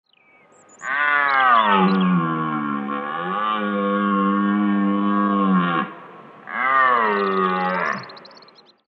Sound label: Cervo Cervus elaphus